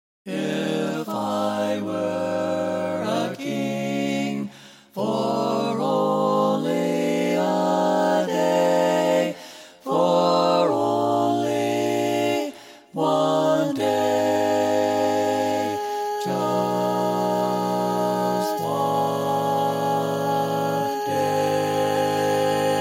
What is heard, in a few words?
Bari, barbershop tags